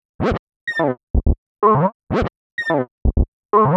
126 D B BASS, Funky; Loopmasters; Funky Underground Vol 2; BASS LOOPS

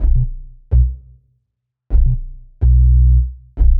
126 B BASS, Funky; Loopmasters; Funky Underground Vol 2; BASS LOOPS

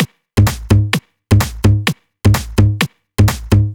Loop, 128 BPM; Mutekki Media; Vengeance Electroshock Vol.2

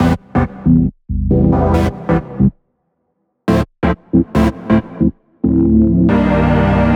Bpm138 Flow Chord Loop AmDmCm, Dubstep

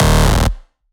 Synth Shot D#

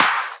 Claps & Snares

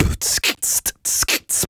Human Beat Box 140 Pop; Loopmasters

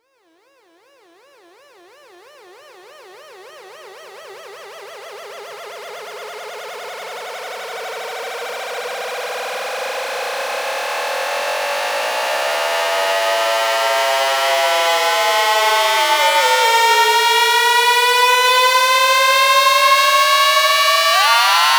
FX Uplifter

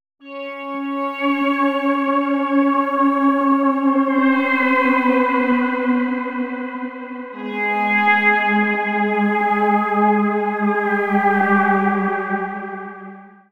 Bpm135 Floating Lead Loop Db Ab, Dubstep; FX Loops

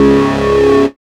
HARD Db2, dubstep; Jack Sparrow; Deep Dubstep Progressions

